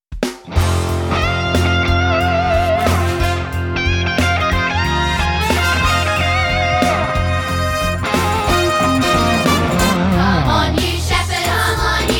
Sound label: Gospel Vocal